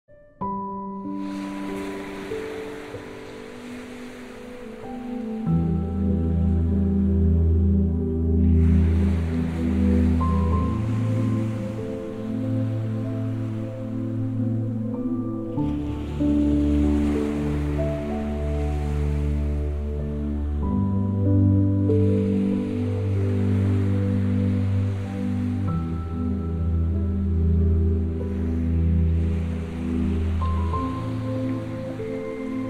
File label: Relaxing sleep music